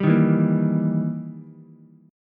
E Minor Major 7th Flat 5th, E, down, Ukulele; strummed; JGuitar

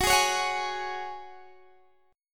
F sharp Suspended 2nd, Guitar, Open D

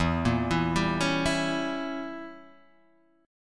Bb Diminished 7th E, Guitar

